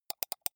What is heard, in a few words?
computer mouse left click double soft